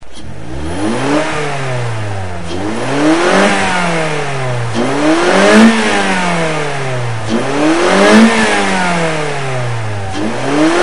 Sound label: Mazda8 NA Intake sound; AutoExe